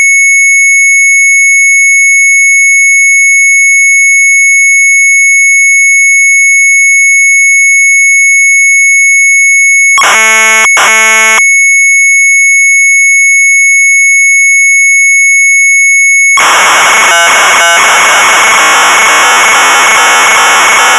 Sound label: Sharp MZ800 Tape; retromelsarchive